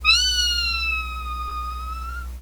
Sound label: Miyaaaaaav